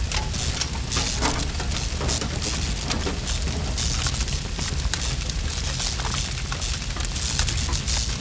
burn metal; Star Wars Knights Of The Old Republic II; XBOX

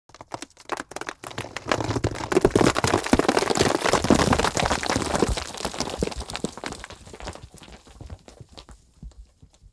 Tumbling Rocks; Environment

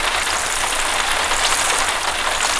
rain windshield; Quantum Redshift

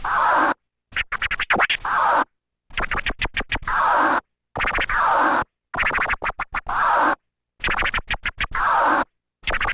Scratch; Matt Hoffman's Pro BMX 2; XBOX

